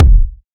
kick one shot d key, bass drum tuned to the D note. Loudest frequency: 206Hz